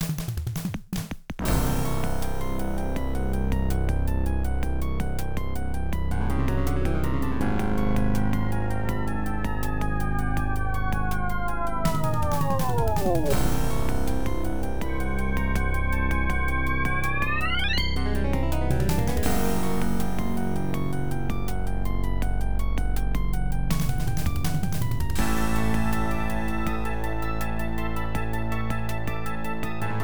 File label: RACE TIME; Spider CM - FM